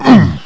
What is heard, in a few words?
fall; opentdm; Morbo